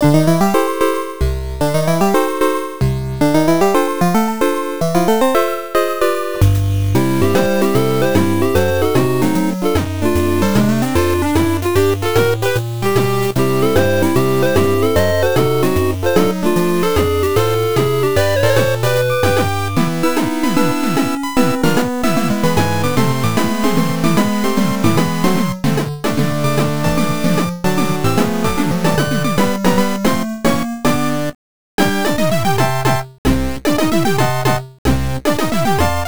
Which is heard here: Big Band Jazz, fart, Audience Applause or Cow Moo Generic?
Big Band Jazz